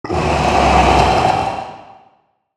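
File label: Zombie Aggressive Reworked, monster sounds; TheDeclineOfWarriors; Monster Sounds Volume II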